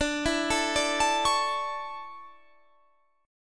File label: D Major 7th Suspended 2nd, chord